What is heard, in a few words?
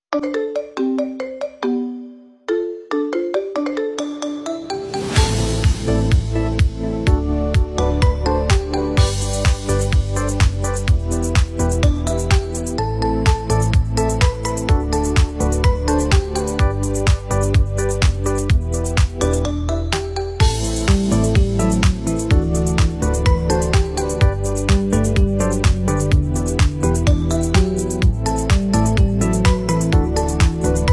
(Marimba)